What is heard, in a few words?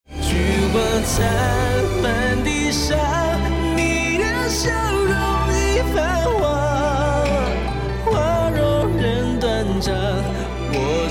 lost round; music kits custom